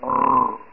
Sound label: zombie grunt pain